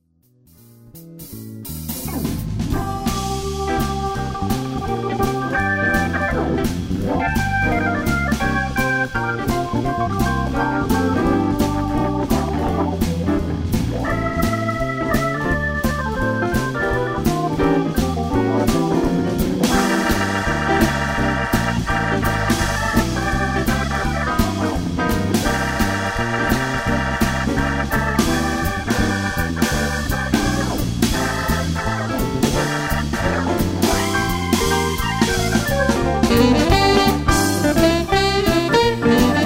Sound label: Blues, Variety Band